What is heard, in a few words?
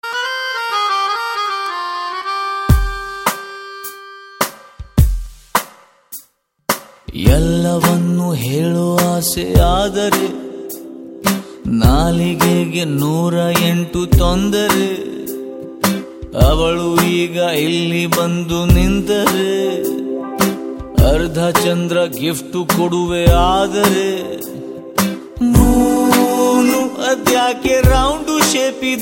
Ringtone